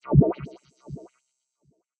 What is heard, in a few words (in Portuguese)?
Sfx - Bolha Pequena Estourando; Neon Souls; PS4 PS5 switch windows xbox one xbox series xs